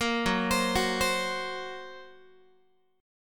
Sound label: F sharp Major Flat 5th F sharp Guitar; F#Mb5 arpeggiated